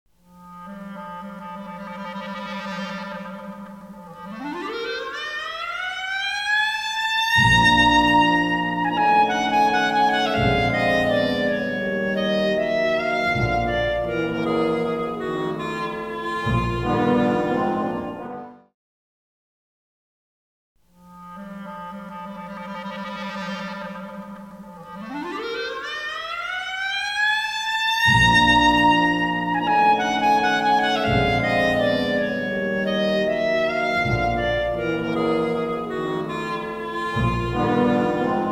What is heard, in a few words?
Portamento